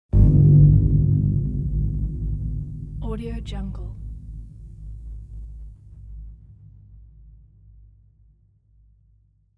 dark horror; volkovsound

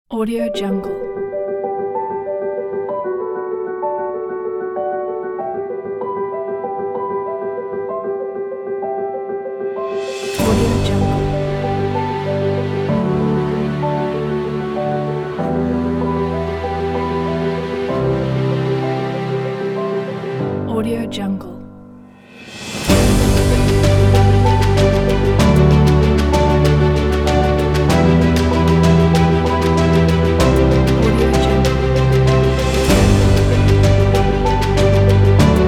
inspiring epic piano

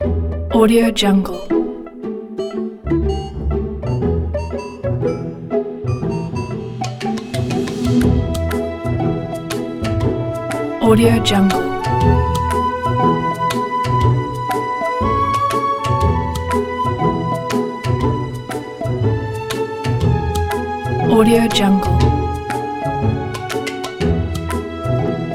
happy humorous game

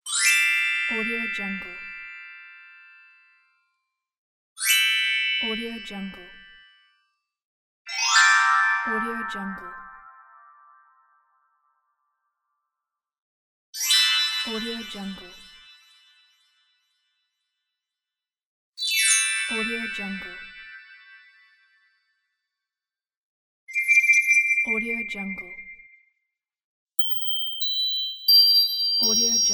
magic wand

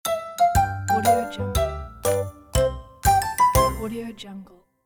playful fun kids musical transition; applehillstudios

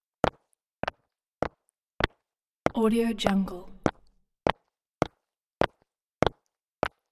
wood steps

